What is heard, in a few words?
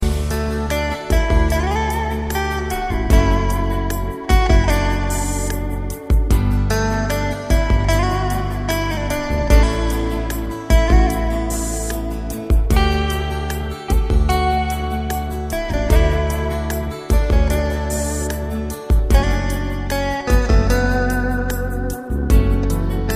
Romantic Guitar Tune